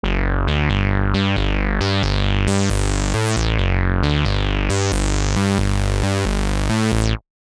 BS Funky Moug; Diva; alonso myon diva soundset vol 1

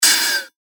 FX Hat, cymbals; revealed cymbals vol 1

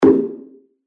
PERC C Alpha, percussion; revealed percussion vol 2

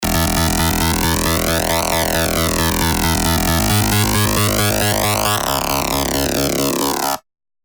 Gritty, rolling bass; Revealed Serum 2 Rolling Bass Vol. 1

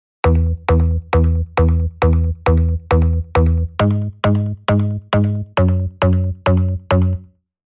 Offbeat Organ, techno bass; Spire; revealed spire techno bass vol 1

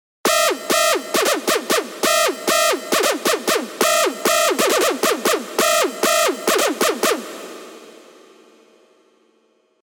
Screech; Revealed Serum 2 Big Room Techno Drops Vol. 1

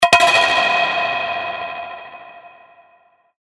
percussion; Jiayou; revealed percussion vol 2

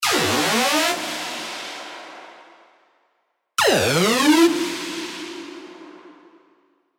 Laser, serum2, fireworks; Father John's; alonso fireworks stand 1776 edition

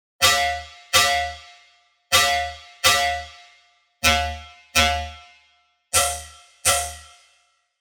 SY, bass tech house; Bling; revealed serum 2 bass tech house vol 1

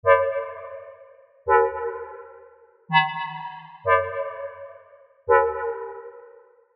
SY FM Stab, serum 2 bass tech house; revealed serum 2 bass tech house vol 1

